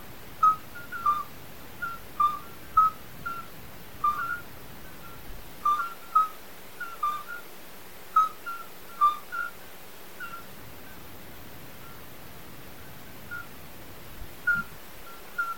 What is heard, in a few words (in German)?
Audio Geburtshelferkroeten